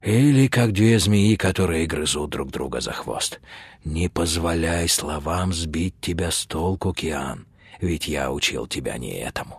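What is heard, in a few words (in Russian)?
garmon, accordion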